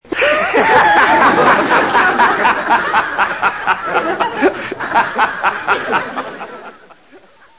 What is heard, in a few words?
laugh; Arthur